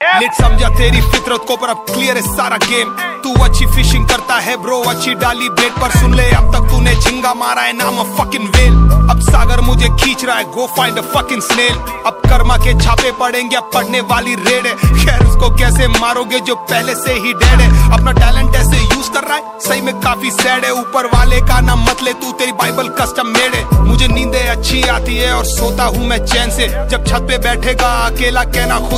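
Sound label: Rap hindi ringtone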